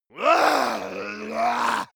monster male growl aggressive; kreaturen

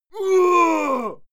male fight moan heavy; schreie; menschen; soundpool

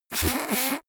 nose blow

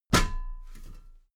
step metal ladder step top heavy

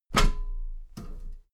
step metal ladder step top regular

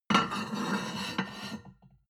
plate on plate place slide soft, restaurant cafe; sport-freizeit

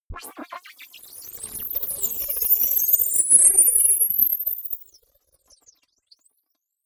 alien communication long; comedy-cartoon; aliens

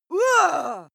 female fight attack cry; schreie; menschen

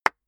clapping very slowly

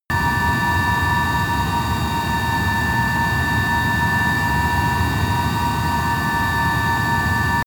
military jet bomber turbine steady tonal noise; Airplanes - Jets

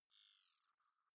zoom out light, signale

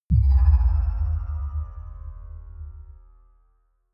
sci fi bell bass

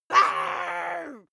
witch growl; kreaturen

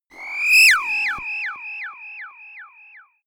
mini laser; swooshes-swishes

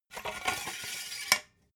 metal scaffold pole retract; industrie-wirtschaft

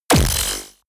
sci-fi shock blast

